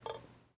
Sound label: water drip in empty pan metal pan constant; prosoundfx